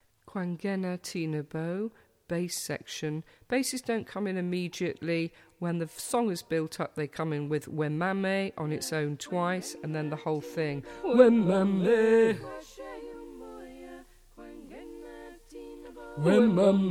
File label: bass; kwanghena